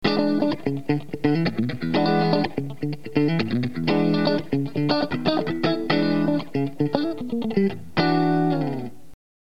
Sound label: Guitar through a digital effects processor